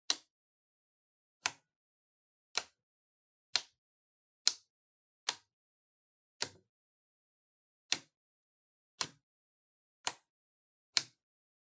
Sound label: Soroban Lower Plus